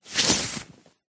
foley clothing shirt movement rustle arm out sleeve; zapsplat